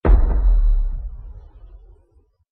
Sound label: Cannon; Hunger Games